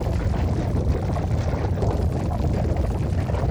Underground Move Loop

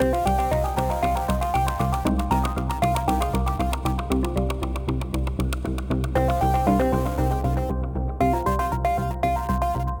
Hacking Loop 117 Bpm

